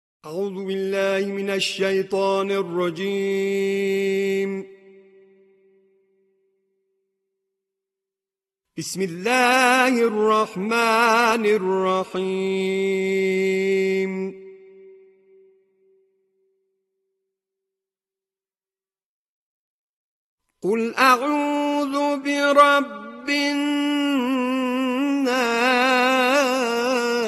تلاوت سوره مبارکه ناس

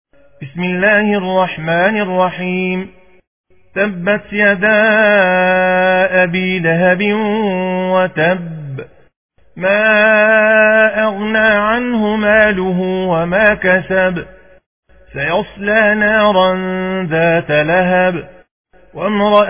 ترتیل قرآن کریم روایت حفص عن عاصم; عارف العسلی; سوره مسد

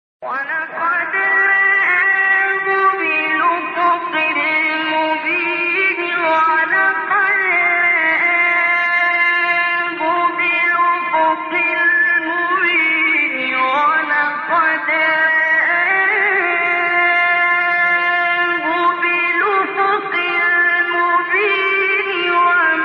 تلاوت زیبای آیه سوره; 23 24; تکویر; استاد عبدالباسط

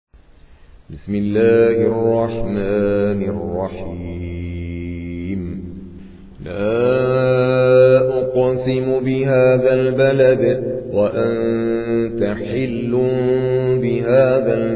ترتیل قاری هندی; سوره بلد

